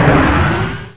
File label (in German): presse; hurrican